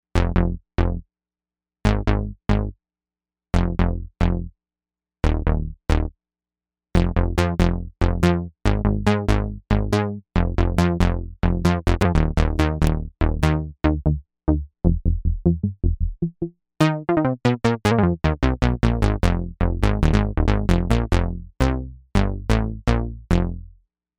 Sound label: Snapalectra Bass, Novation UltraNova; Factory Patches